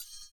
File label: CYM, Ethno Techno; ILIO - Ethno Techno